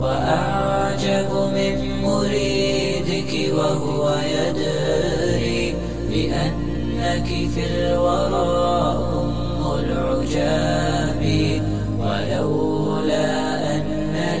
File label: Nasheed Long Longing